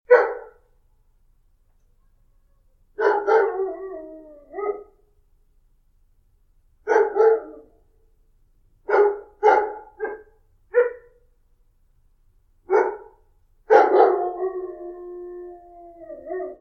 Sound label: dog barking